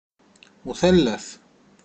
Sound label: triangle